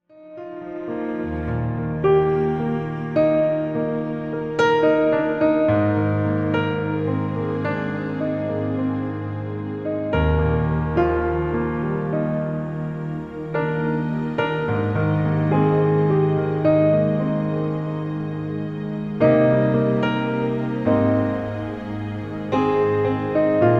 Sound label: slow piano orchestral; Fash Video Music Demo